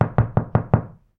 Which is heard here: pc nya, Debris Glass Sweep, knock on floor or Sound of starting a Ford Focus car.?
knock on floor